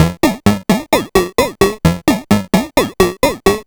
Sh101 Loop, 130BPM

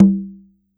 CONGA LO; E-MU Producer Series Vol. 7 – Old World Instruments

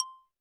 percussion, Roland TD-20

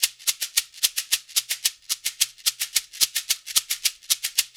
percussion loop

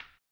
HAMBONE, Vocal Blues Soul; Spectrasonics; Planet

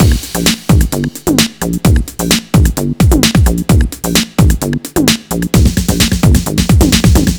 full, 130 bpm, nu dance electro; Zero-G; Soundclash Nu Dance Electro; Construction Kits; Feet First

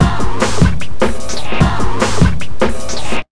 150, Big Beat Drumloops

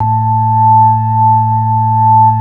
B-3, Hollow; Roland LCDP10 Keys of the 60s and 70s 2; Hollow Xfade

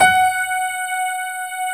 GRAND PF F#4, AKAI S-Series; AKAI S-Series CD-ROM Sound Library VOL-7; D.GRAND PF

